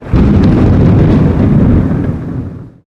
explosion; maoudamashii

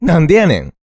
voice human; maoudamashii

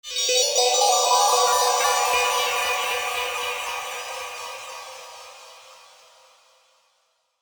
magical; maoudamashii

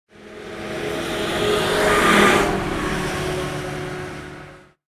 se car; maoudamashii

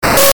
retro; maoudamashii

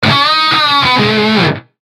instruments guitar; maoudamashii

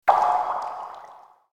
element water; maoudamashii

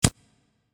ignition; maoudamashii

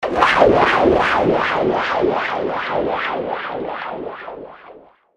magical; maoudamashii

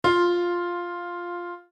instruments piano fa; maoudamashii

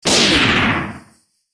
element fire; maoudamashii

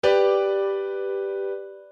instruments piano g chord; maoudamashii

